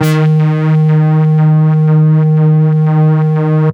Tech Bass D#1, techno 120bpm; musicradar; Bass Multi

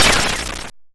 Snare, YM, CHIPSHOP HITS DRUMS; ChipShop Deluxe

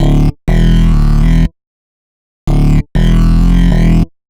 Bassline 97 E, hiphop, 97bpm, Bass; musicradar

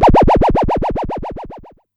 woop woop woop; Single Hits

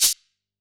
Shaker; drumnbass 170bpm; Drum Kits; musicradar; Drum Hits B

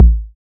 Kick, techno drum

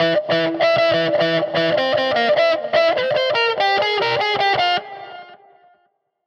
Guitar Line, 100bpm, hiphop; musicradar

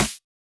Snare, techno drum

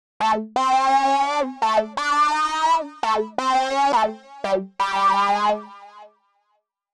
Synth line 85 A, hiphop 85bpm; musicradar

